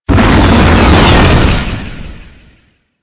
Space Fire Debris; Ambience; Space Battles; Warframe

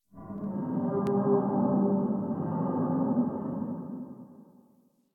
Exterior Distant Calls Scared, Ambience; Warframe; Duviri

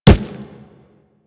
Kubrow Run Dirt, Footsteps; Enemies; Warframe

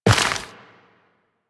Step Dirt, Footsteps Walk; DE; Warframe; Tenno

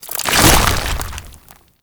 Infested Bone Blade Scatter Shot End; Warframe

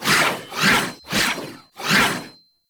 Sword Swipes Distant; The New War; Lua Valley Ext

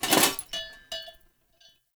Knight Melee Swing Foley; Enemy; Mendicant; Mnd Knight

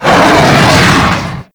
Random Gutteral C, Infested Quadruped; Warframe

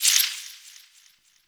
Interface Hover; Warframe; Tau; Somatic Bearer

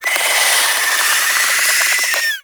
Infested Face Hugger Charge; Warframe

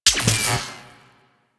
Rifle Secondary Mech; Warframe; Weapons; Wisp Rifle

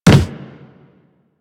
Run Wood, Footsteps; Warframe; Operator

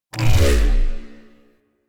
UI Corpus Theme Close; DE; Warframe

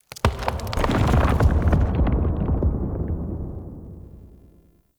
Root Movement, Root Cave; Ambience; Neathuns Root Cave; One Offs

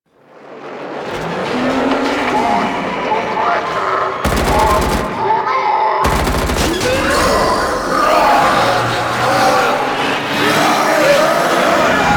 Ship Engines; Warframe; The New War; Grineer Eidolon

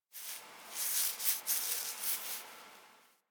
foley interact scratch lie alert right; Enemies; Warframe; Catbrow

